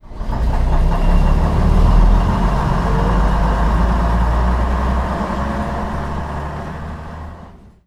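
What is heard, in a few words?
leyland atlantean, bus; Simutrans; Pak128.Britain-Ex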